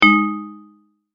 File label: multimedia tone exclamation; McKinney Sound